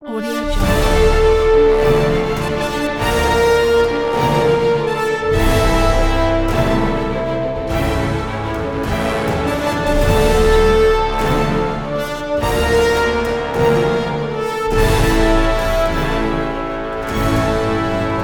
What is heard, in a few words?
epic intro; golden-field